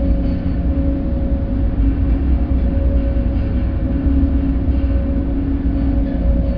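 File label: ambience vents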